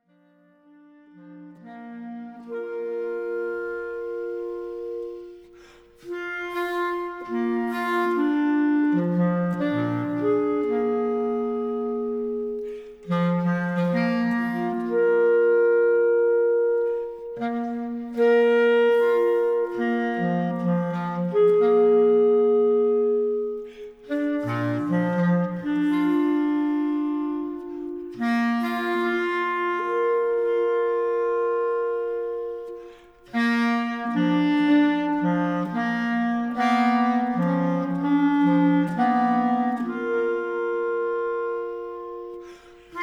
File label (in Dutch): Fragment uit Leichte Uberlappungen voor twee basklarinetten; Tobias Klein